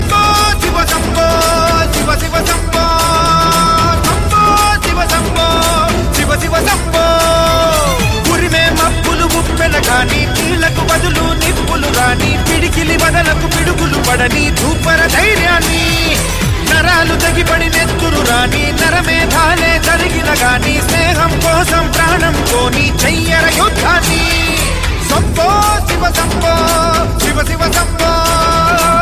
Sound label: shambo shiva shambo, shiva ringtone; Navratri Special